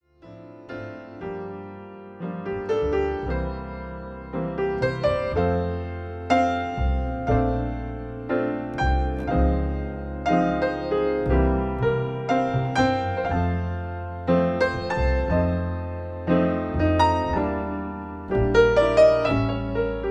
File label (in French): Duo piano et contrebasse